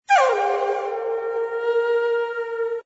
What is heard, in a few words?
BGM